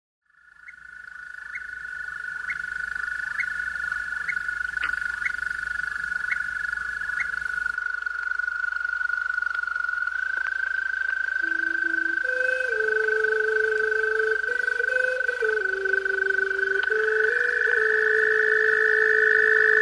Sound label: late night serenade